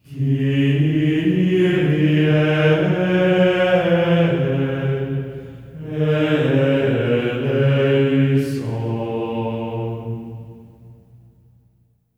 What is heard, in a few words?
KYRIE D#4, voices; Spectrasonics; Symphony of Voices; GR KYRIE